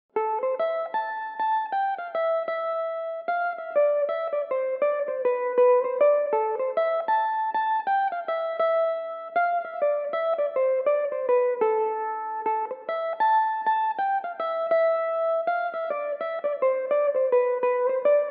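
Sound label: A minor, irish mandolin, tunes; under the bridge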